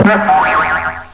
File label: Silly Boing; The silly boing collection